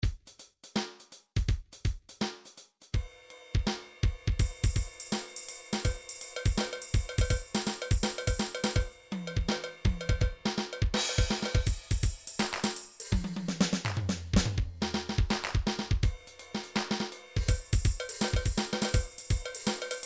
hip hop; sonar

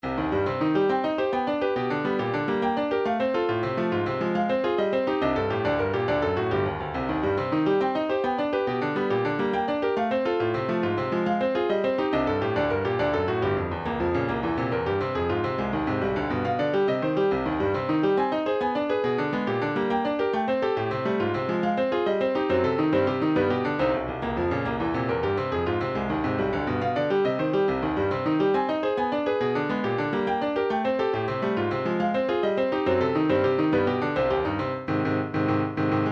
piano sonata, midi

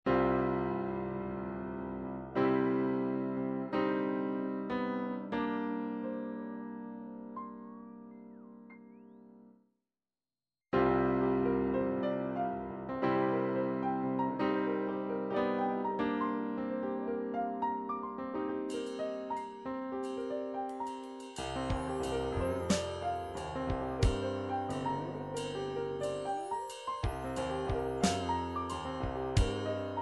triphop; Newtronic Triphop Construction Kit

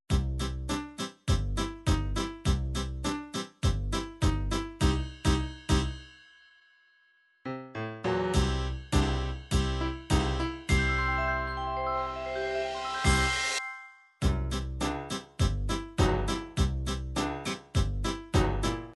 latin vals mariachi, midi

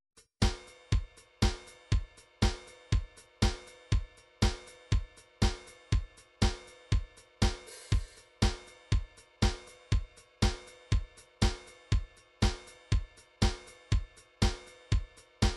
drums, midi drum patterns

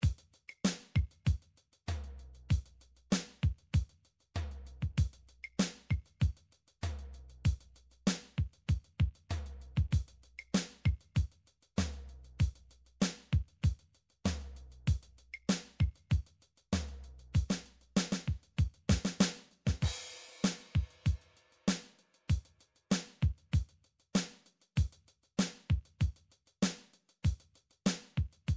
midi drums pop rb

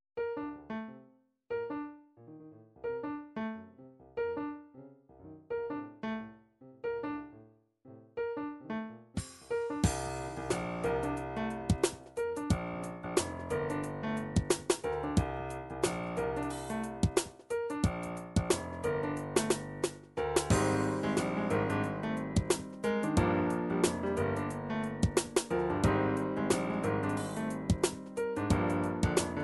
triphop; Newtronic Triphop Construction Kit

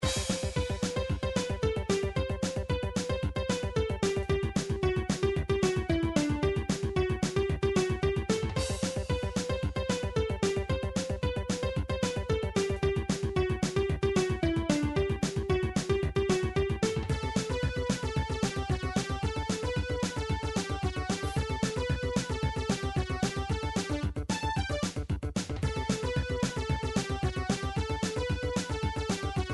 in game with power up; nintendo stinger

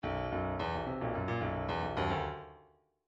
bdf midi file grooves funk e funk e

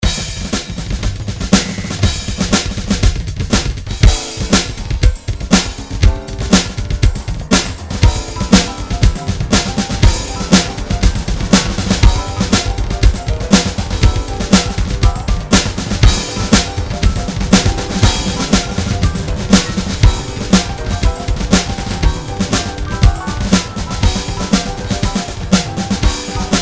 drum grooves midi loops funk; Steinberg Nice Beats 1000

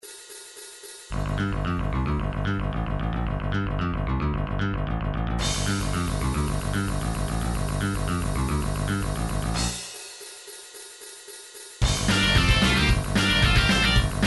title extended guitar remix; nintendo rc pro am

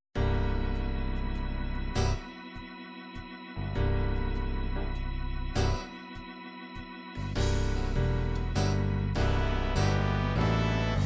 6 8 gospel, midi

